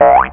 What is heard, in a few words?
boink; AMIGA; Instruments; OctaMED MED